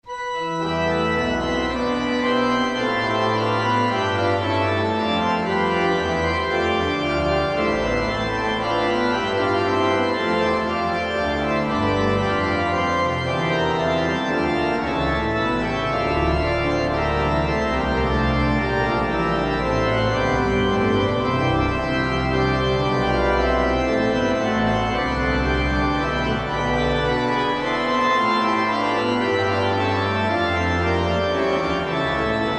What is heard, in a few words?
Organ, Chorale Prelude; J S Bach; BWV 621